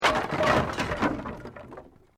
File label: Wood Collapse